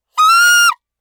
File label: kyaa; gaya